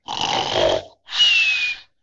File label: snore